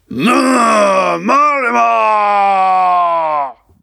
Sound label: battle roar; Zote; Hollow Knight